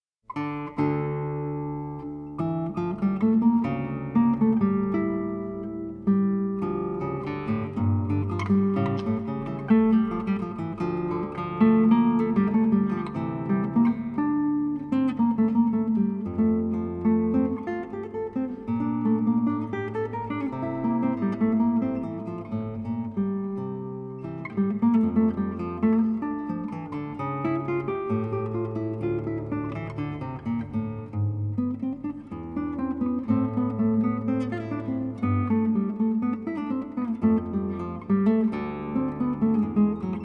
Violin Partita No.2 in D minor, BWV 1004 Allemanda Guitar version; Johann Sebastian Bach